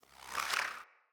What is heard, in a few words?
Acorn Whizz; Cinematics; Bromius; Bromius Tree Rub; Bromius Quest